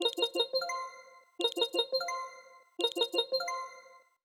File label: timer expired alarm